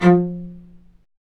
STRINGS, Low Marcato; Roland LCDP13 String Sections; STR Combos 1

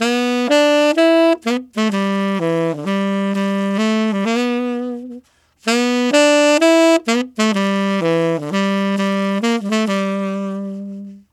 Tenor Country Waltz in G maj, Tenor Sax Loops; Sonic Foundry (Sony Creative Software); Jazz Solos and Sections

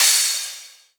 Crash, Cymbals

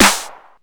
Clap Sd; Zero-G; Soundclash

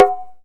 NA, Tabla; Mango Loops

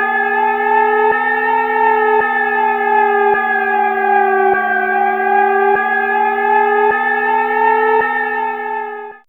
UFO, Fairlight CMI IIe

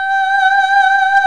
VOICE & BELL

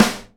SNARE DAMP, Live Snares; PlatinumPhattCD1; E-MU Producer Series Vol. 8 – Platinum Phatt

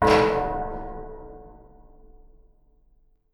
big clank

